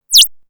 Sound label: Little Bird